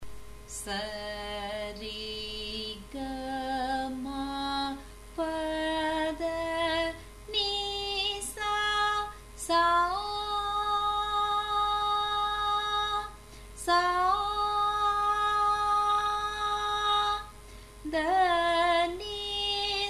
Thara Sthayi, G sharp

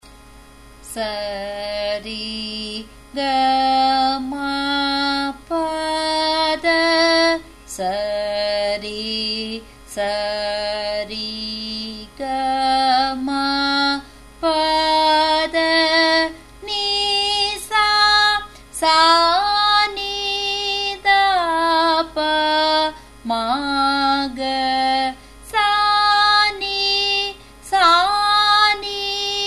Sarali Varise G sharp, music; Carnatic Music

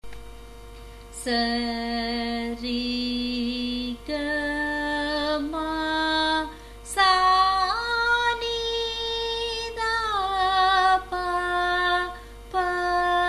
Dhatu Varise, A sharp

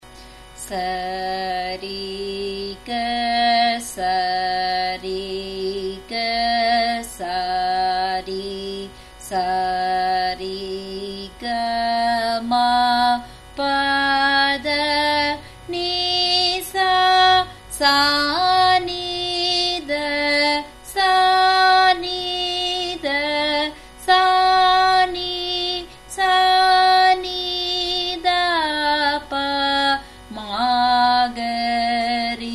Sarali Varise, F sharp

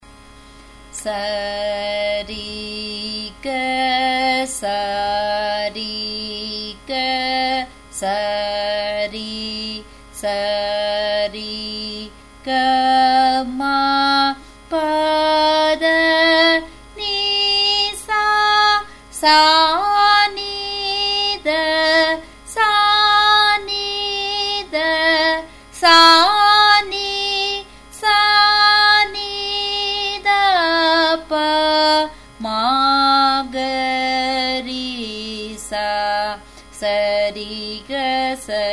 Sarali Varise G sharp, music; Carnatic Music

